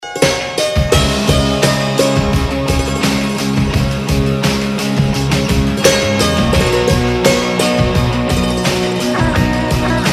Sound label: Instrumental